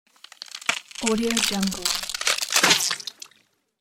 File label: skeleton breaks bone